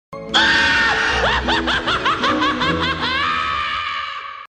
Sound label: evil laugh